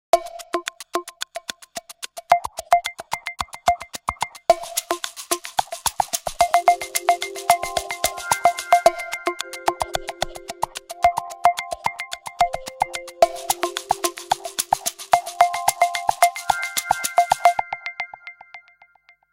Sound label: Nokia Tones; Windows Phone apps